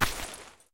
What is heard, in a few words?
vihr clip grab; Escape from Tarkov